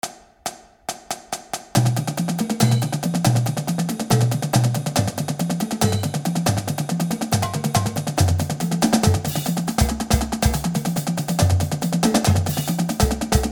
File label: Paradiddles 140, drumline exercise